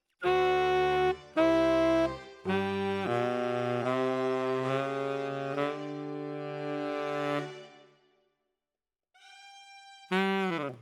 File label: Tenor, RNR for piano